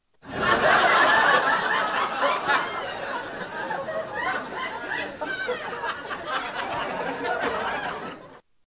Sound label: laughing